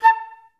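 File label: flute staccato A3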